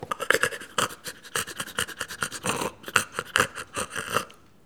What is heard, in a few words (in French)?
reniflement animal